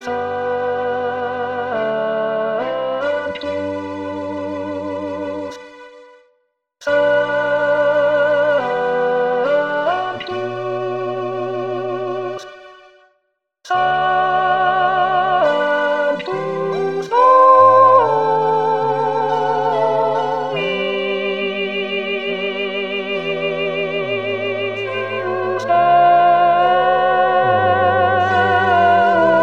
PucciniMessadiGloria sancts Alt

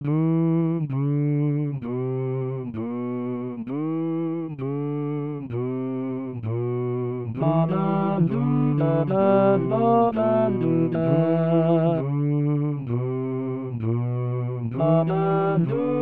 Follow that Star All; Xmas; Xmas 2019

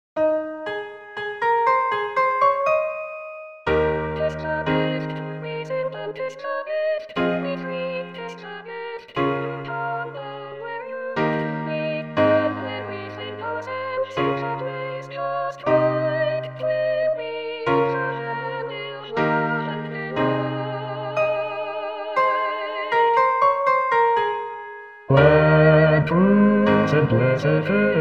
Bas; Simple gifts

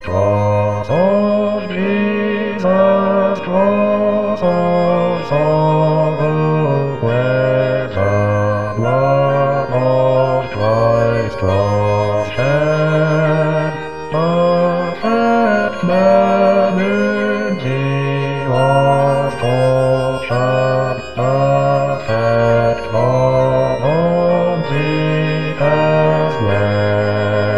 Bas

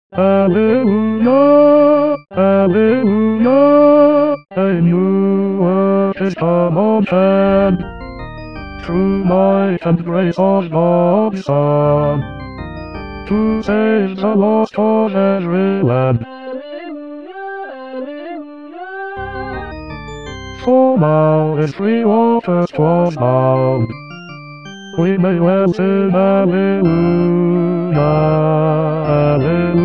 Alleluya A New Work Bas1; Mathias; Xmas 2019

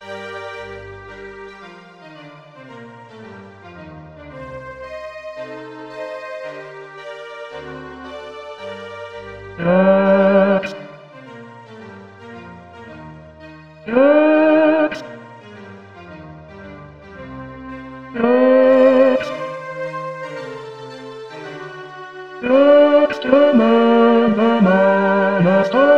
rex tremendae Bas, mozart requiem; 04 Rex Tremendae

